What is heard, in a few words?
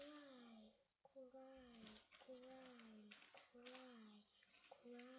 cry